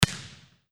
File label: volleyball serve